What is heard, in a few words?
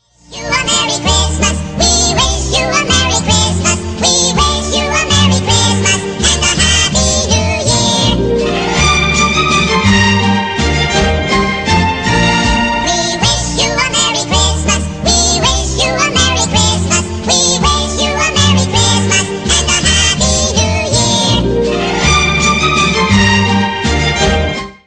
merry xmas; chipmunks; holiday